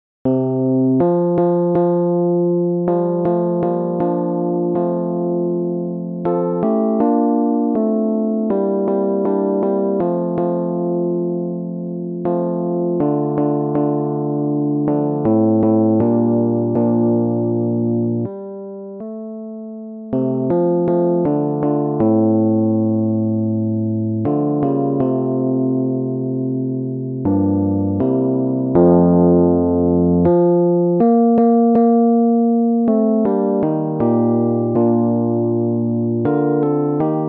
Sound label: shenandoah Bass